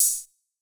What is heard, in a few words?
mod hat, Synths and FX, Mod Drums; neuro; Sinistarr